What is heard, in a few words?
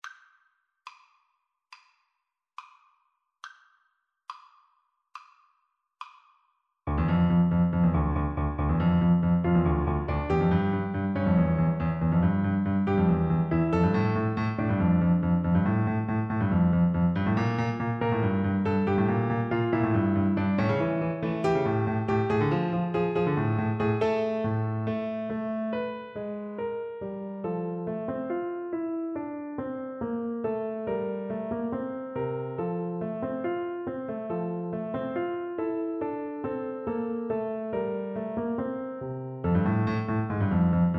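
viola karaoke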